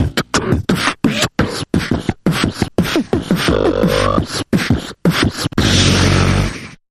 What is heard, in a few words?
Comic Mouth Percussion Variation